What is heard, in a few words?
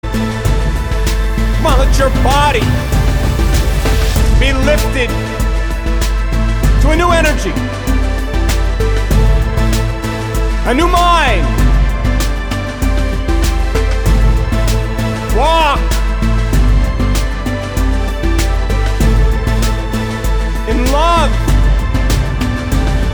Walking Meditation